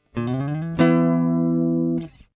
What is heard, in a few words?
new email; amsn